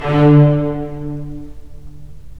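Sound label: STR, strings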